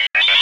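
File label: sphere blips medium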